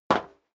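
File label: step sneaker metal dry single left, footsteps; schritte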